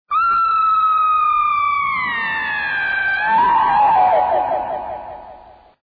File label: Screaming